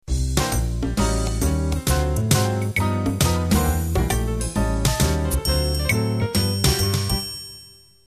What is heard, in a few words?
Club Jazz Intro